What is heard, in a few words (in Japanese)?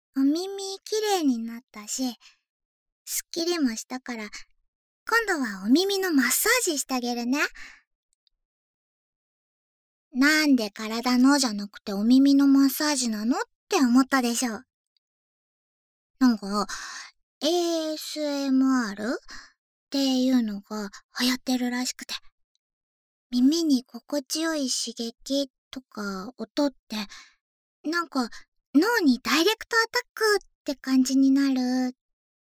お耳のマッサージしたげる, ASMR